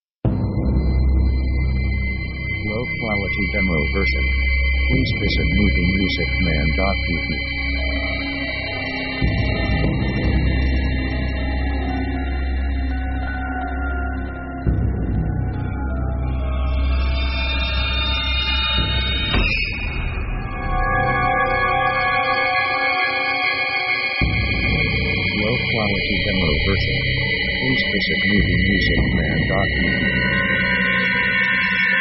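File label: orchestra creepy scene; Dark mood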